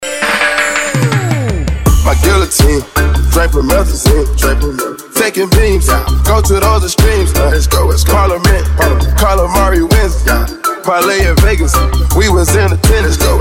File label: reggae remix